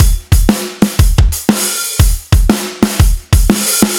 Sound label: Tri Beat C